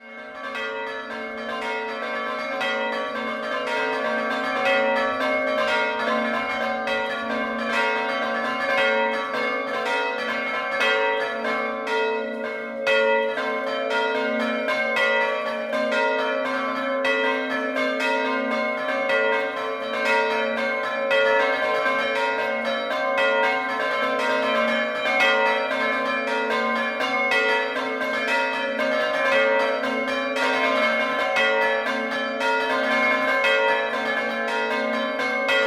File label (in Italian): CORMOR BASSO, campane